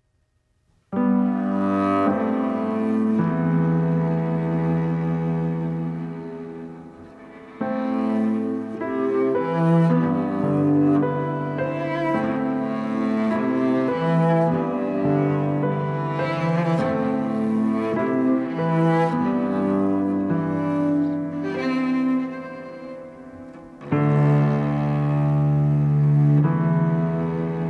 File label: piano